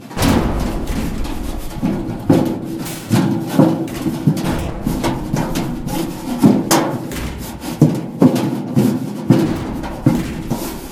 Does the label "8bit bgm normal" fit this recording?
No